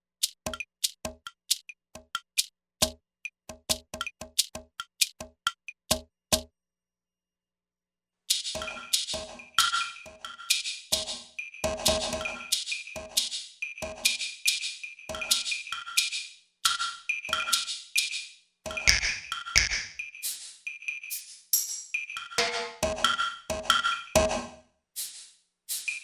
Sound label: SLAP BACK